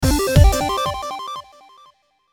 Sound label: Hit Drums Digital Beeps Delay; Sound Effects:Musical; Blastwave